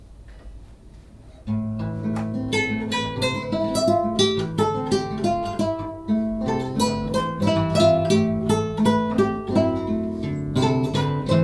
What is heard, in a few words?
hold music loop